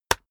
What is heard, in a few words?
keyboard external enter hit push